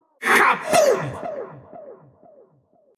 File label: kaboom; bo2